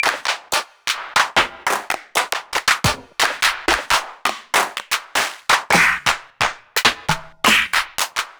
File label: Akai XR-20 Claps